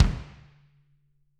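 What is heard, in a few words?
bass drum; Bob Clearmountain Drums I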